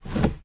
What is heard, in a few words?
driver foley wheel turn; Blind Drive